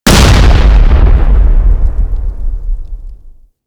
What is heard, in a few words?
Grenade Explosion; Warframe; Explosions; Generic Grenade